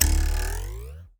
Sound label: Ruler Twang, cartoon Foley Fx; musicradar; cartoon caper